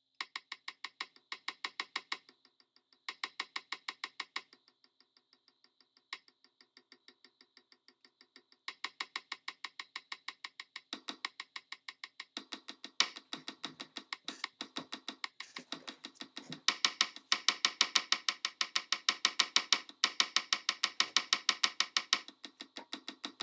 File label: European Fuel Prices Sound, Sonification of European Fuel Prices using Drum Sounds